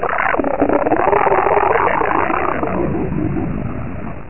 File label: die; mystic radar; mr zombie1